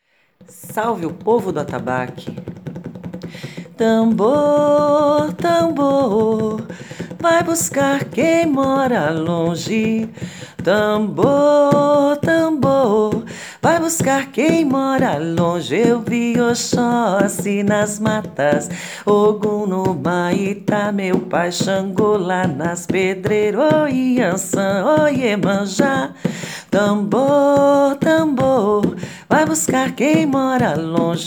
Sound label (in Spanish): Tambor tambor